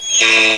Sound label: pit drone pain; betapitdrone